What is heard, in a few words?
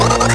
ship open; shroob ship; DS; MARIO LUIGI PARTNERS IN TIME